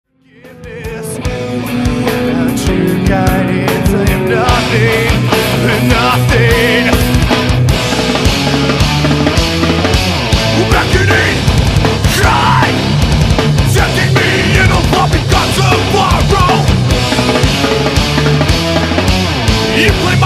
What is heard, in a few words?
Voodoo Hex